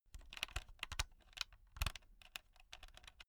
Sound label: insert usb laptop wrong long; objekte